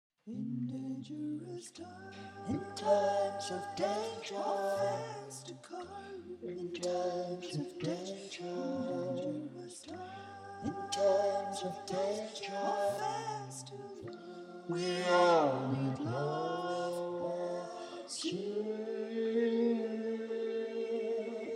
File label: dangerous times chorus low backing